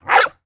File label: Dog Barking; Animals; 1001 Sound Effects